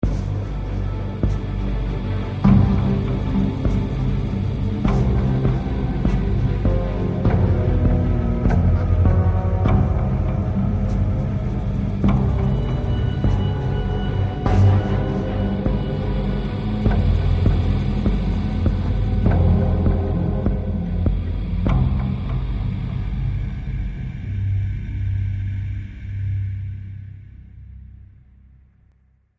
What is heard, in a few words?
Loop Tense; Tales from the Borderlands